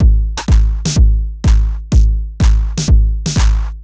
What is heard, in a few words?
drum loop stripped 125, Classic Chicago House; Sample Magic